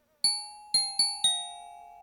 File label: Gliding finch